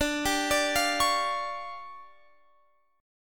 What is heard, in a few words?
D Minor Major 7th Double Flat 5th D, guitar